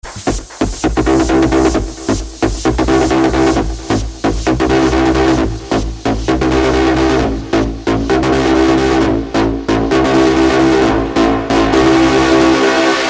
house ringtone

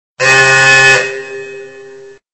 alert message

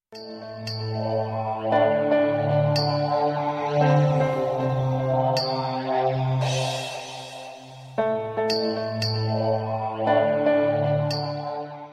eerie tune

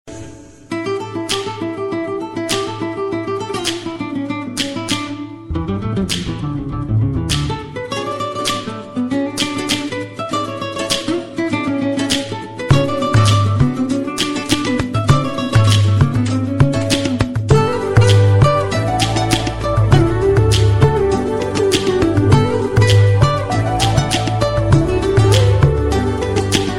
guitar rocks

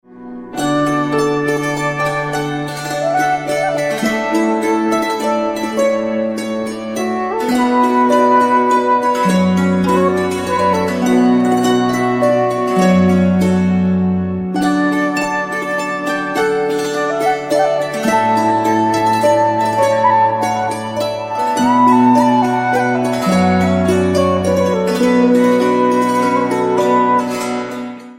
romantic instr

